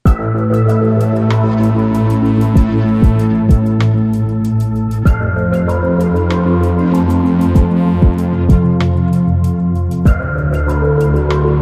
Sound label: No Choir